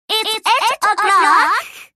clock

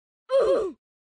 OOF; cave

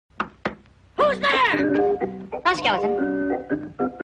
knock knock

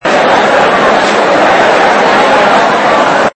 crowd, reverse

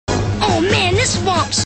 whomp

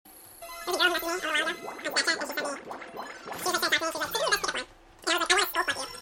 smooth

